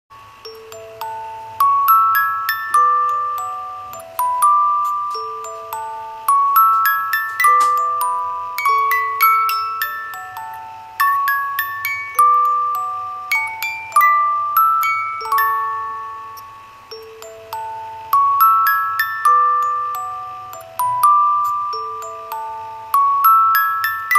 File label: Music Box; Beauty and the beast